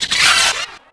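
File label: droid spider dead; Star Wars Knights Of The Old Republic II; XBOX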